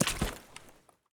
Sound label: water footstep